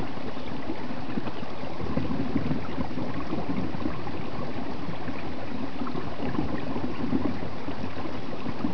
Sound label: stream beneath ice